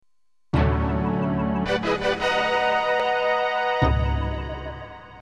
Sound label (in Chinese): 电视包装音乐